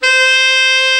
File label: BRA TENOR, SAX; Best Service Gigapack 1; BR SAX 4